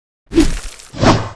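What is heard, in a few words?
Mob attack; Bossmob; 야수맹; 염우귀; 2단계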